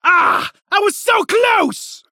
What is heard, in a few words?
Arcade Game Game Over No High Score